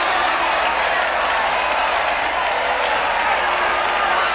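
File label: ambience crowd big loop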